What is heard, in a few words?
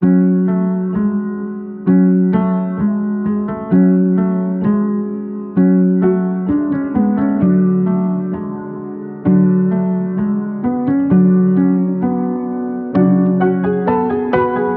130 BPM F# Min, Melody; Ice Cream; Cymatics; 2022 Melody Collection Platinum Expansion